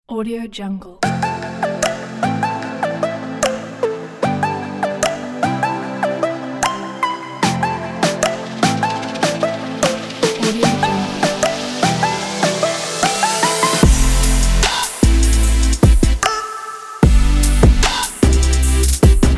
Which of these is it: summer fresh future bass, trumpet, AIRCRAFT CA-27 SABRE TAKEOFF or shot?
summer fresh future bass